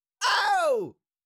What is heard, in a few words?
Cartoon little monster voice shout scream short